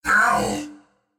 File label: ouch; Smite; ymir v3 skin20